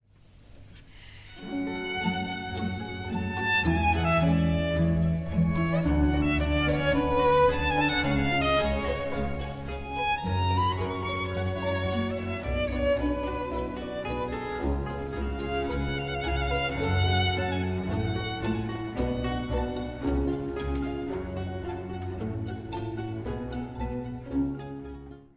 violin ringtone

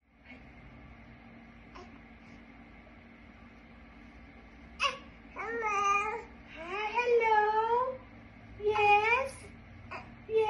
baby ringtone

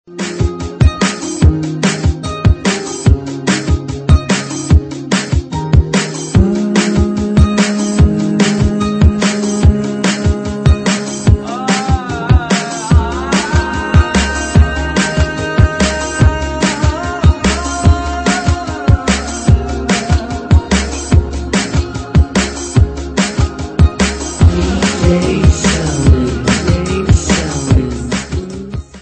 flute ringtone

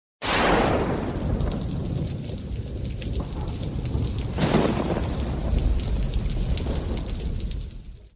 3d rain ringtone; android

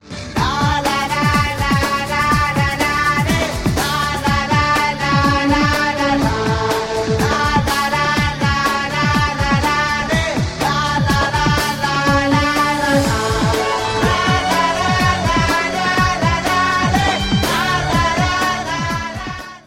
indian rock ringtone

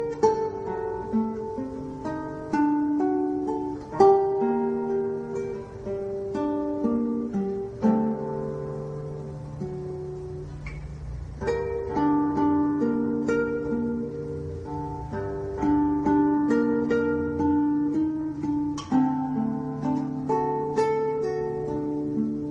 classical guitar ringtone; android

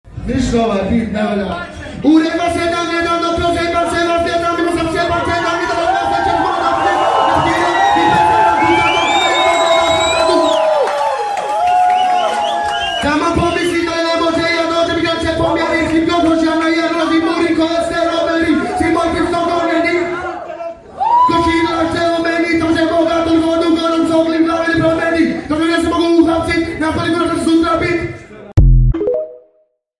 beat ringtone

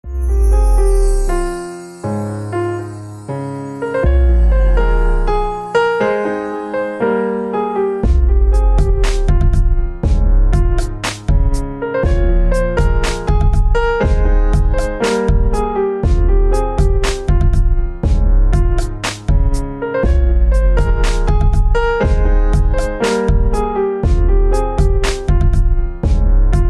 emotional piano ringtone; android

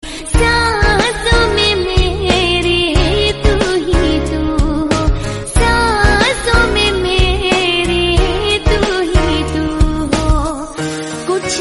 christian worship ringtone

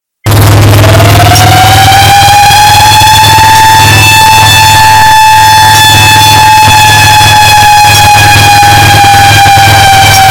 alarm ringtone; gta 5 cayo perico

